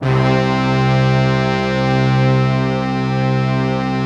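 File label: brass tutti, Brass Tutti octave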